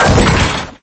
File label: droid death sound; lego star wars